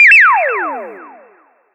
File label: Breakdown FX, Underground UK House & Electro; Sonic Foundry (Sony Creative Software)